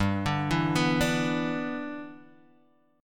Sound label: G 6th, Guitar, Standard; G6 arpeggiated